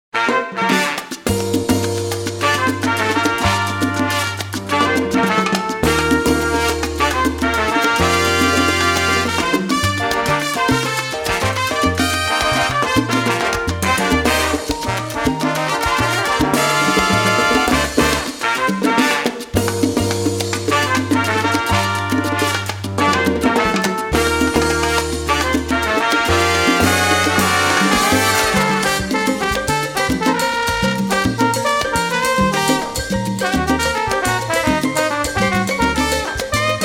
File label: Latin Jazz